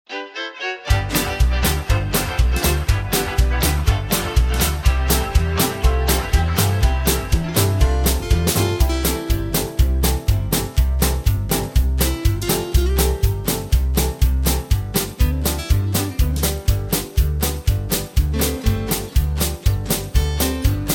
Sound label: C No Backing Vocals